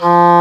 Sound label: WND English Horn; Roland LCDP04 Orchestral Winds